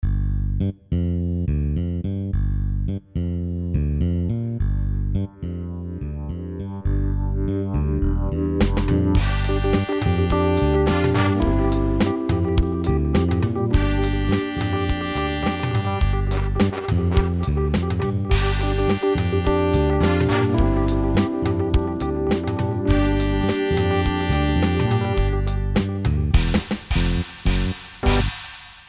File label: MIDI ditty